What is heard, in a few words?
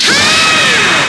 power beam